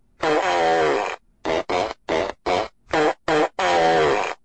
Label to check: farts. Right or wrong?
Right